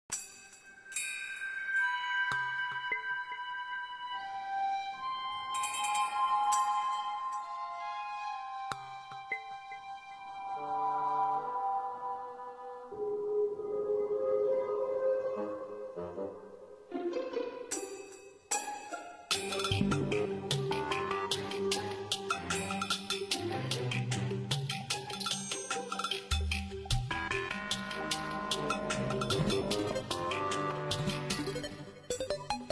Music Desert

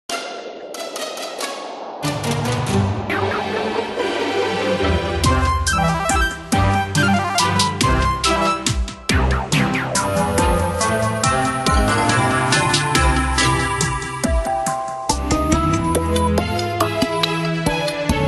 Music Windy Day

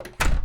Door Closed

